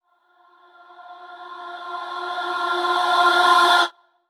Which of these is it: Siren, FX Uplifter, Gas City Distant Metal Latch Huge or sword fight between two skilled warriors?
FX Uplifter